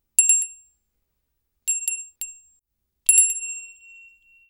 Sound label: Bike Bells; Fairlight Sound Lib